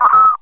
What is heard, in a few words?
cutise